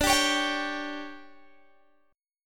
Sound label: D-Major 7th Suspended 4th, D, Guitar, Open G